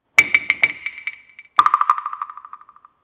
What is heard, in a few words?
Jungle Tension